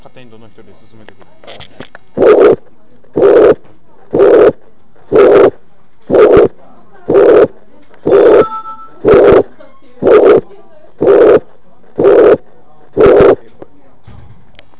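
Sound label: Mitral Regurgitation (MR)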